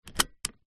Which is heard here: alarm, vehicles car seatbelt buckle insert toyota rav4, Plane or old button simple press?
vehicles car seatbelt buckle insert toyota rav4